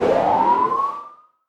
xp gain

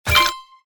tip success

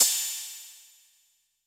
cymbal, electronic

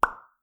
pop

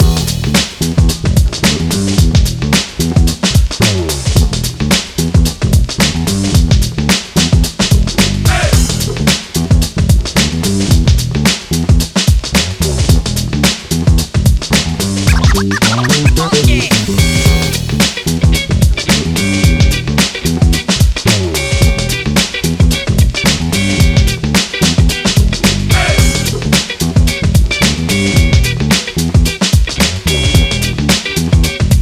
Main BGM; steal-money

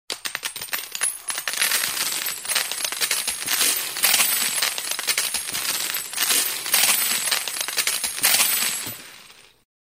falling chips

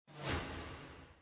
dash

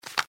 move

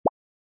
click

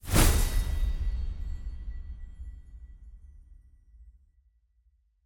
teleport Arrive; spellbound

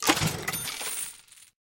button Buy

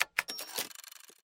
settings Button

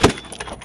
grenade launch

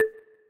autoplay Button Click; berry burst

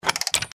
landmine trigger

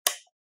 switch

